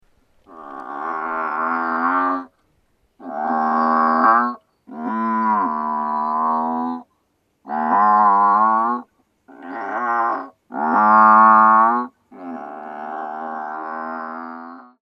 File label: cow in drainage